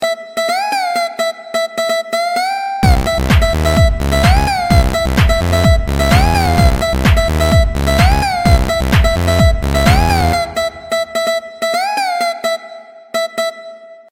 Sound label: sms tone